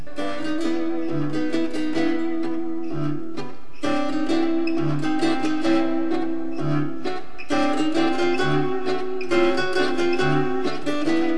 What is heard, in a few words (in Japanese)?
ツィター; Zither